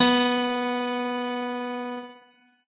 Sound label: piano; pianojs